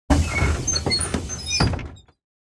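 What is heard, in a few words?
school bus door open; brakes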